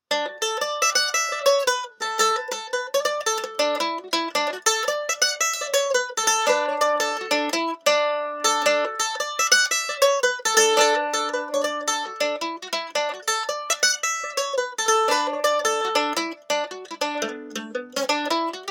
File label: Mandolin Pick Comparison